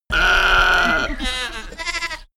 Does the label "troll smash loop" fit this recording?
No